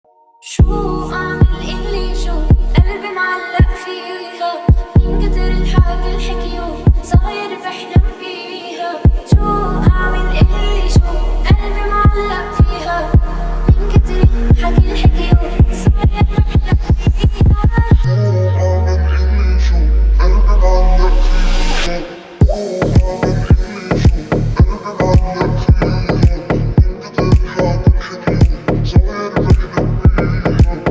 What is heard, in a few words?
fi ha remix